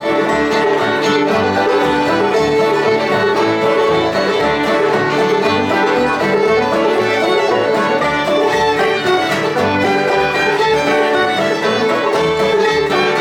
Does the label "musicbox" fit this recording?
Yes